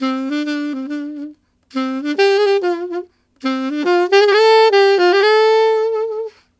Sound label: Alto County in D min, Sax; Sonic Foundry (Sony Creative Software)